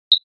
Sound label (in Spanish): ave; la-chaux; choir of species